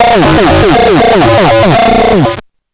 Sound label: heavy machine